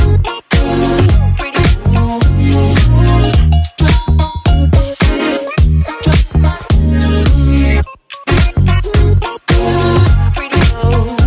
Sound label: english music ringtone